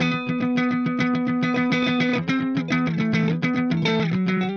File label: Clean Funk Guitar